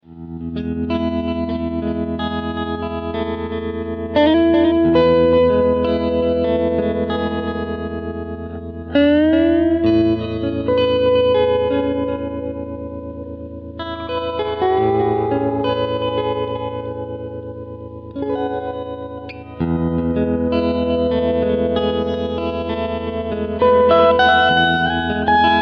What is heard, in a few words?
Ambient - Sleepy